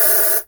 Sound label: Open Hats, Cymbals